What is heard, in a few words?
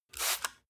spray bottle single; haus garten